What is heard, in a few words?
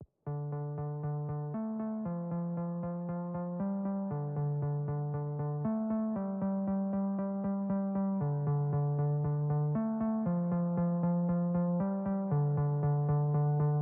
Synth